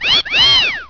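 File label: wolf whistle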